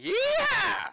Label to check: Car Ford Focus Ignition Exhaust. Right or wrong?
Wrong